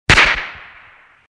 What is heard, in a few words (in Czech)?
vystrel z pistole